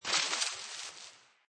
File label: Skiing Snowboarding Landing After Jump Distant